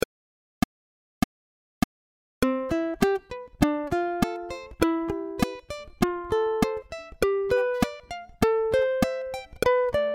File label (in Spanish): C Escala Click